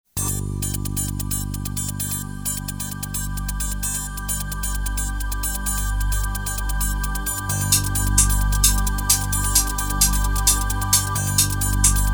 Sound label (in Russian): музыка для минутки